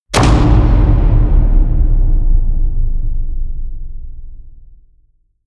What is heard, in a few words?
hit metal